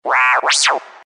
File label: game over; STAR WARS